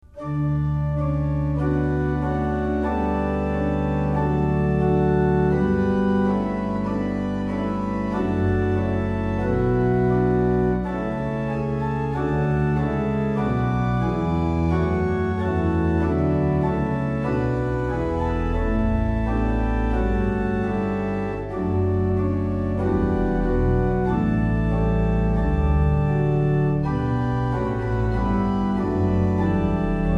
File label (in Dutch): Stichtelijke orgelmuziek